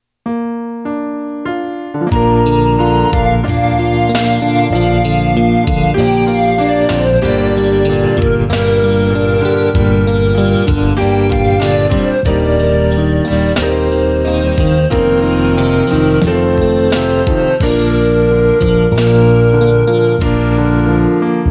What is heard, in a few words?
inst